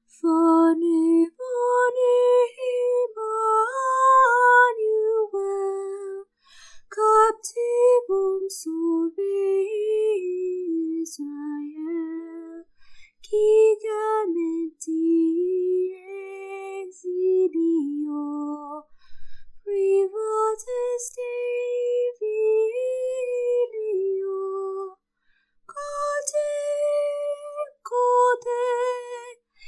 Veni Veni Emmanuel, Singing, Latin, Christmas hymn; [Latin I think]